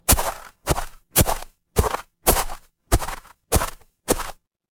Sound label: footsteps snow